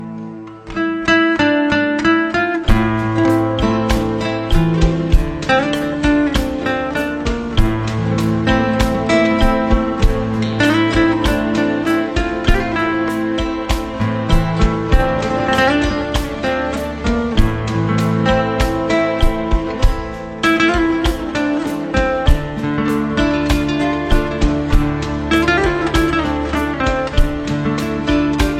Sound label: Guitar